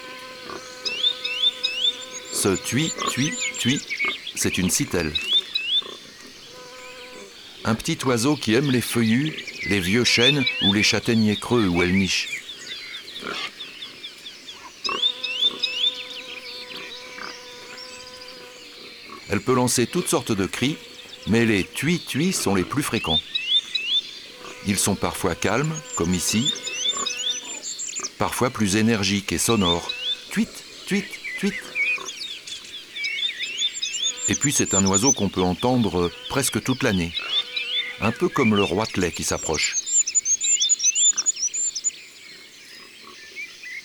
Sitelle torchepot; ABC de la nature

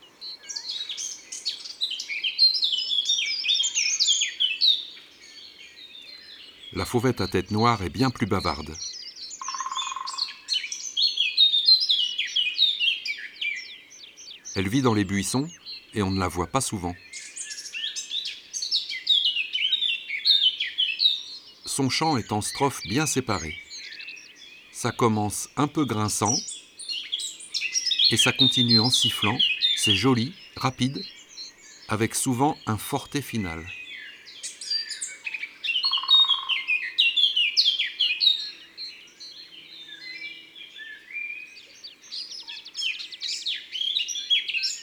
Fauvette a tete noire ABC des chants d oiseaux

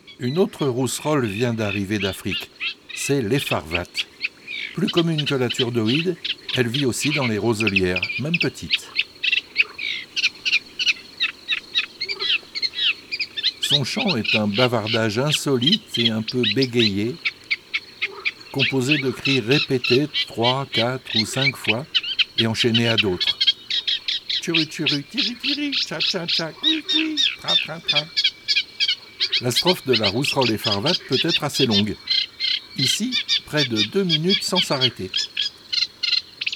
Mai La Rousserolle effarvatte

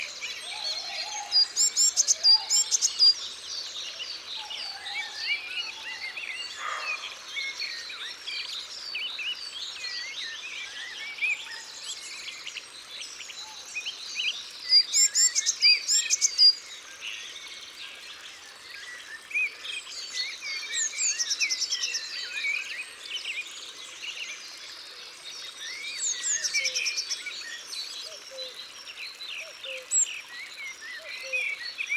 Mesange bleue; Isere; Concerts naturels de l'Isere; Studio Les Trois Becs

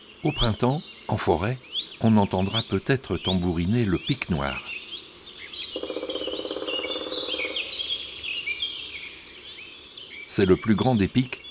Le Pic noir, chants d oiseaux

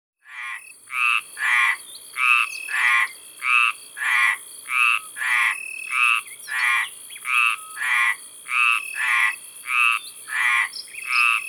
Rainette meridionnale; abc de la nature

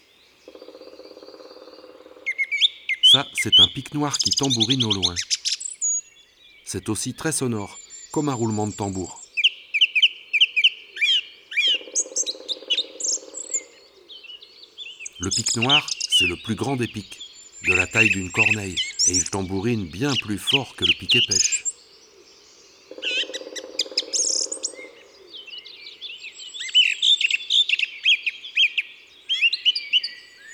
Pic noir tambourinage; ABC des chants d'oiseaux

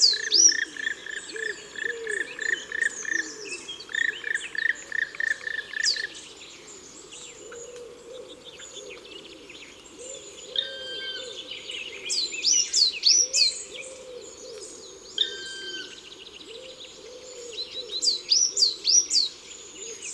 Pic noir cris et tambourinage Concerts Naturels de la Drome; Studio les Trois Becs

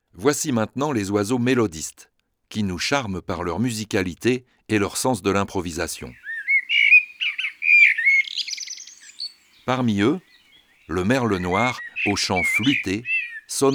Merle noir; oiseaux des jardins

